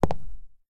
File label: Footsteps Concrete Shoes; starbites; Oneshot